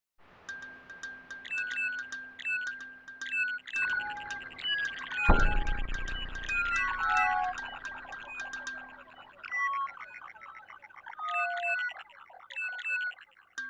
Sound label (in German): kalimba und banjo; ew6; Ethno World 6 Complete